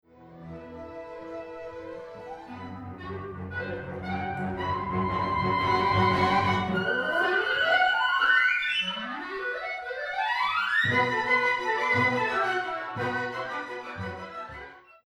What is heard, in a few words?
Ginastera, clarinet